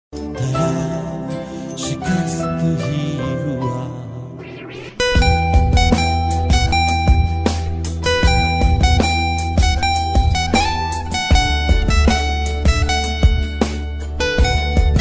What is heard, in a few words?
ringtone guitar love sad song sad music ringtone